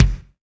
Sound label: MIX BD, AKAI S6000, DRUM RYTHM; AKAI S6000 CD-ROM - Volume 1